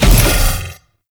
Grendel Bowl Jump; Warframe; Grendel Deluxe

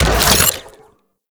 Ball Projectile Hit; Warframe; Stalker

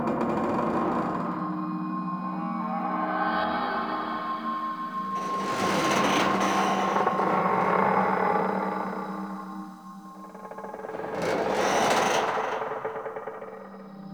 Exterior Metal Creak Small Loop; Warframe; Duviri; Ambience

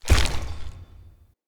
Foley Land; Enemy; Characters; Corrupted Sproutfolk

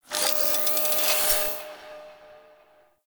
Mods Station Anim On To Off; Interior; Ambience; Warframe; Nineteen Ninety Nine; Tailor Shop

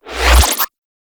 Cast Start; Warframe; Bard

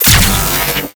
Laser Ability; Warframe; Corpus; Ambulas

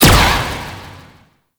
Galleon Turret Fire; Warframe; Ambience; Grineer Galleon; Vor Galleon Fight

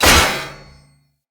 Great Sword Melee Impact Metal; Weapon Impacts; Player; Swords; Environment; Founders Great Sword

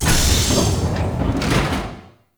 Vent Wall Open; Ambience; Gameplay; Warframe; Entrati

